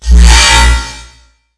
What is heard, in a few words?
Damage Pickup